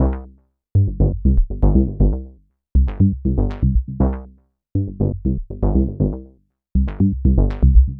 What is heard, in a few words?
Bassline, Prototechno